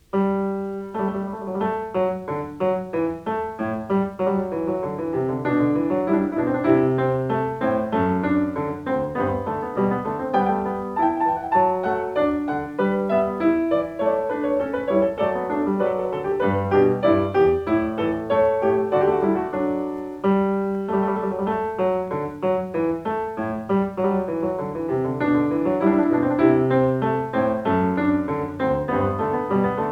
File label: Goldberg Variations, Variation X; Claudio Arrau; Bach; BWV 988